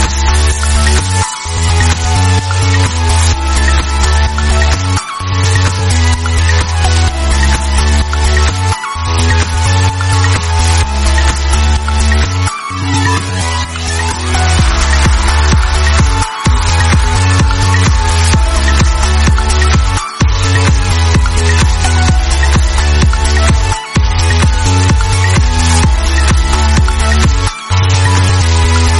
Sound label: african techno; ringtone